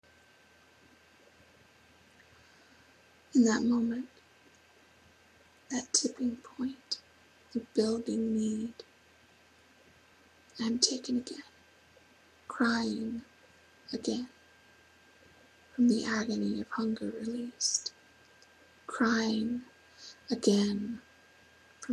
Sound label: Crying Again